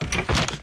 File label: wardrobe appear; run-dorothy